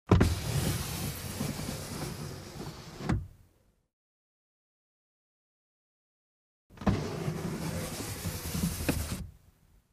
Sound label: Car glass lowered, raised manually